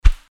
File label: Fighting Swing Sound; FXHome